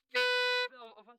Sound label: Sax